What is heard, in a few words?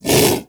Vocal Bark; Hunting Critters; Predasite